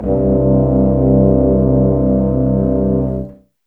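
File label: 60 dark, orchestra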